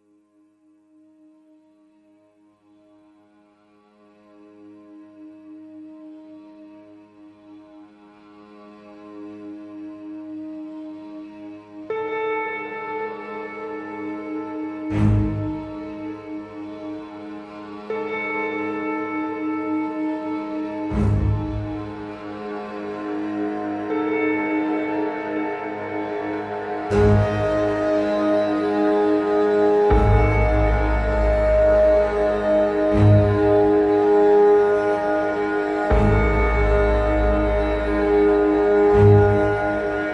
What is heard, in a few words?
Orchestra Only